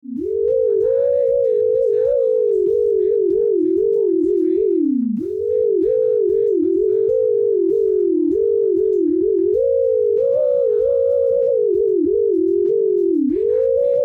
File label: ensemble